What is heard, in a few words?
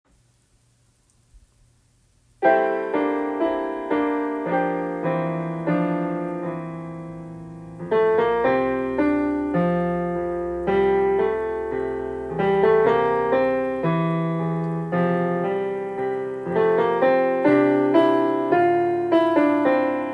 melody